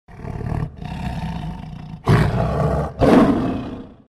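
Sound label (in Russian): rychanie lva, lion roar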